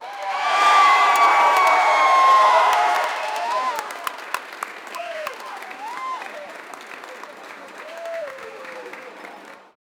crowd of 400 people clapp

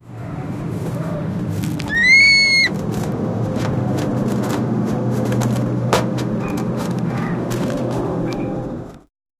an airplane ramp a girl

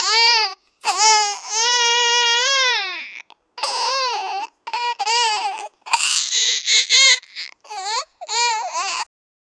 baby cry

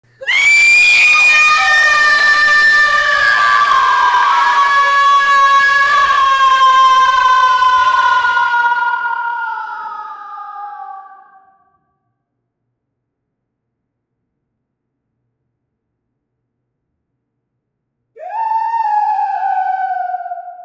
woman cinematic scream, despair

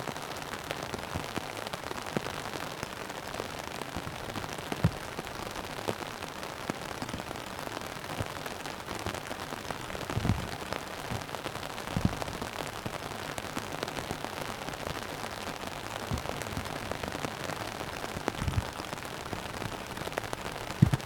soft rain ambience heard; raindrops

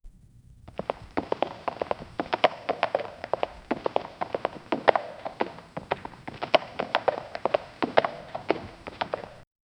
slow horse walking

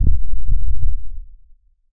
neon pulse a quick subtle

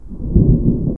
charging lightning; thunderstorm

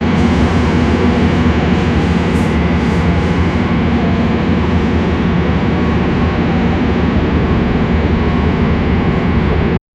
subway train rattle sound

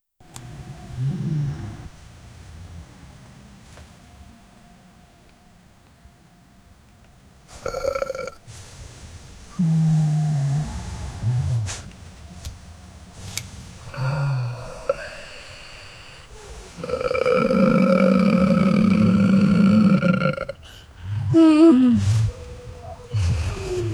grunting moaning and heavy breathing

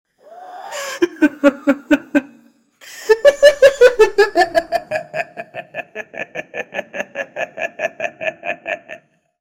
demon laugh

